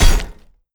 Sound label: Defense Damage